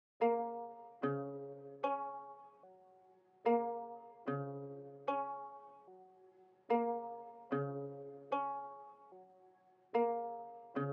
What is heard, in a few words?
148 BPM A# Min Bell; Stems; Cymatics; Hearteater